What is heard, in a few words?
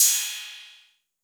Roland 808 CY